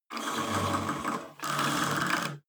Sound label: cable machine old pull release creak, fitnessstudio; sport freizeit